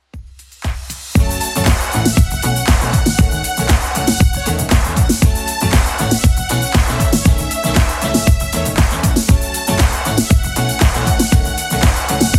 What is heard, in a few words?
80s Clubmix 118bpm